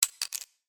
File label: fall; maoudamashii